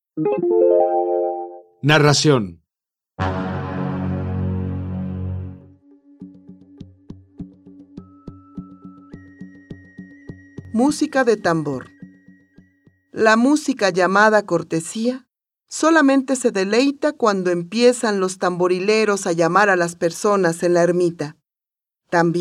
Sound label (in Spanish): Musica de tambor